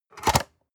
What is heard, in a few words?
storage lid slide close hard, box; objekte